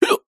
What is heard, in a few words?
human male single hiccup